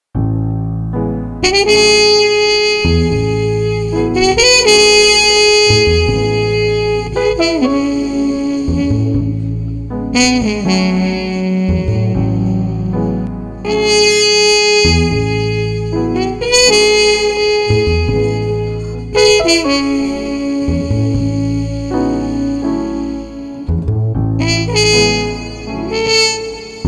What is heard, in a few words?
Trumpet Combo Soothing no Drums 80